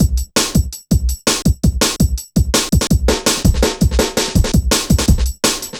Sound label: Programmed Stepz, Jungle Drum Loops